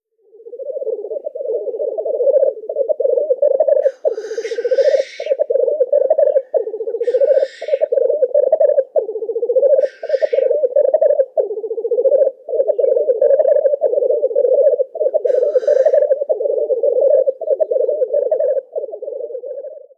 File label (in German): Birkhuhn Tetrao tetrix Balz